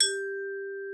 G4, vibes; Xsample VOL-4

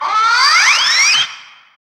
FX Dance

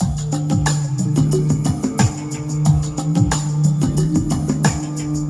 Ambi Groove; Sonic Foundry (Sony Creative Software); Ambient Grooves; Ambi-Grooves

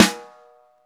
SNR, Roland; Real Snrs

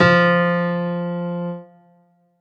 rom grand F, leads

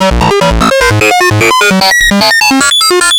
Atonaloop, Industrial Analog

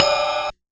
Concertmate-670 Kit Ride, One Shot

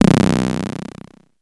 Filtered B, Basses; Zero-G; Total Drum Bass

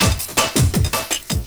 LOOP, 162 BPM, Drum & Bass

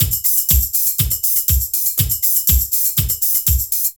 Drum Loops

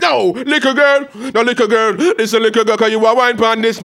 HAL RAGGAM, RAGGA-MUFF A maj

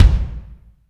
huge kick; NATUR. K1

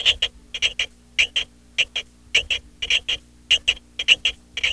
SCRTCH; Funky Ass Loops; East West

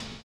HI RIDER; Spectrasonics; Metamorphosis

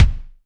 DM5 Kick, Alesis DM5 drum machine

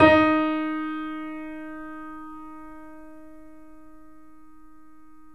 3D Pianos

